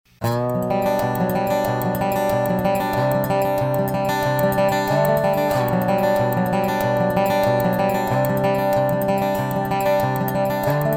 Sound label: Dobro, Direct; Dobro Soundclip